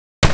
Skateboard Flips; Radical Rex

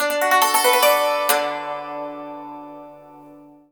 YANG CHING, Heart of Asia; Spectrasonics

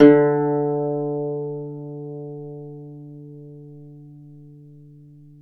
Celtic Harp, Plucked Strings

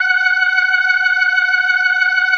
F#5F, B3, Vintage Keyboards

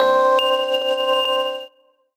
VIB WHIZ; Rawcutz; Nu Forms

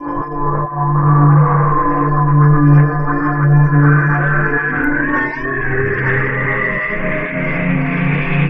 FILTER guitar; Big Beat Samples & More; AKAI

